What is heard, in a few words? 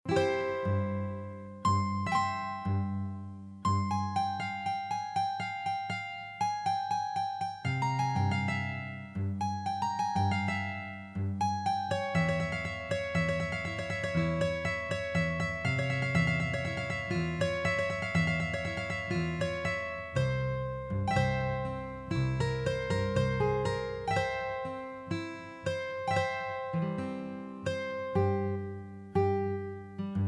Guitar